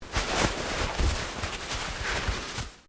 Clothing Shirt Cotton Move Quiet; Movemement sounds for various articles of clothing; Dressing

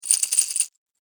Rattle Sound; found sounds; Andrew Fleming

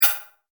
multimedia rollover, Multimedia Rollover Tone; Sound Design; McKinney Sound

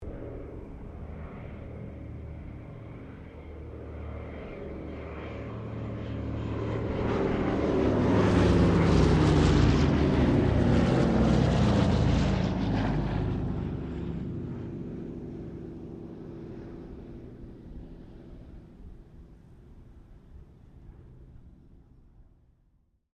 AIRCRAFT DC3 SQUADRON 2 PASS; Airplane; Vehicles; Aircraft Collection

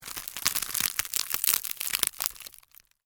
Crunch Basket, Slow, Rip, Tear, Wood; Texture

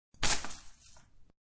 Flwrs Floor, Flower bouquet dropped onto floor.; Thuds; Objects

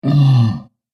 Giant Ogre Tired, monster; horror

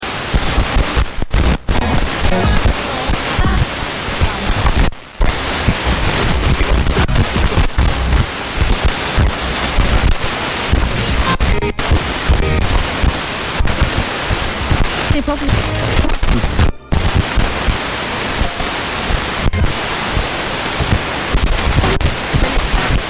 Radio Tuning, White noise tuning an FM radio.; Miscellaneous Electronic; Electronics

